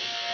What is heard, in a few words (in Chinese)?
电吉他 A#4